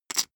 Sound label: poker chip stack small rattle short